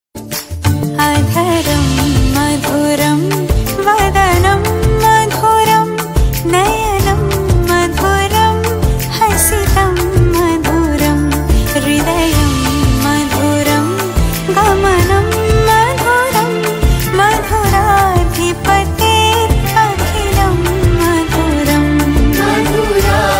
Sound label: bhakti ringtone krishna bhajan ringtone